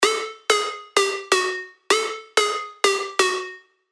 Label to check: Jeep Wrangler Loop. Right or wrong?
Wrong